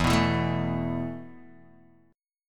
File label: E Suspended 4th E, down, Guitar, Open D